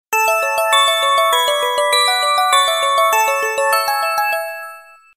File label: Chiming tone